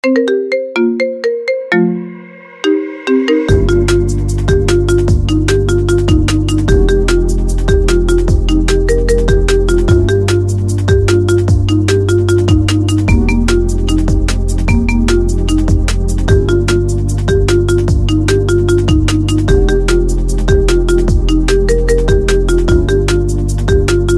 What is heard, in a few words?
marimba ringtone